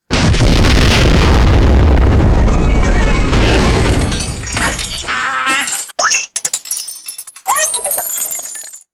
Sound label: cartoon explosion with shattering glass and twang